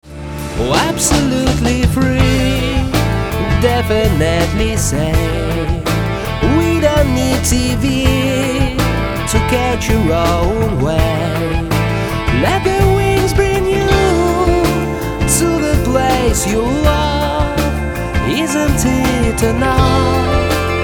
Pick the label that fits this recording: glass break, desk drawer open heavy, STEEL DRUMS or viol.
viol